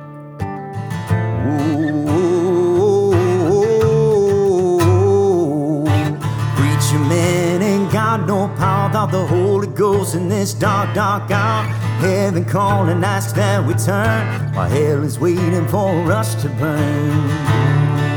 Acoustic

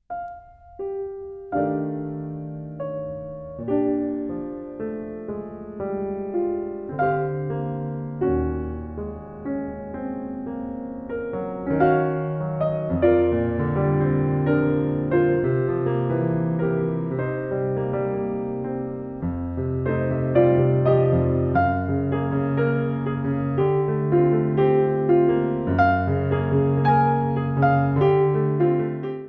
A Minor Seventh Down

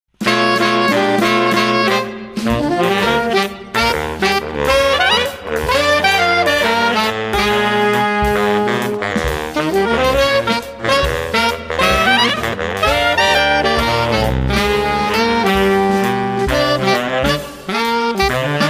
Blues

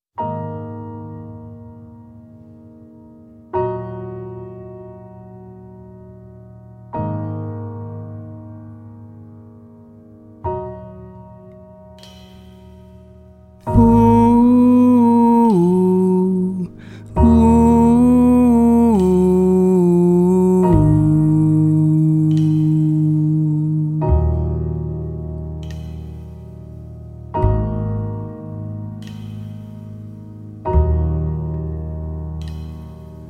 Interlude

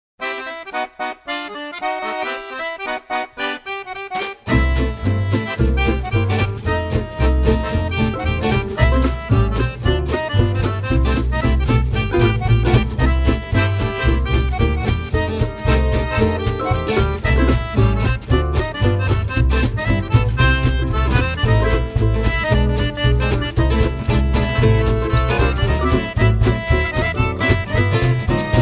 Accordion

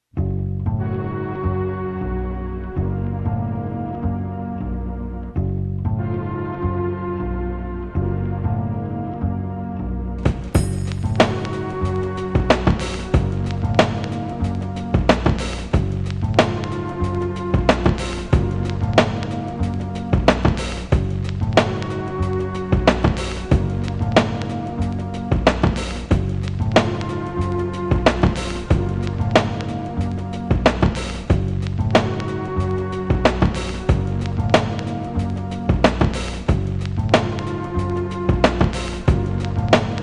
Strings

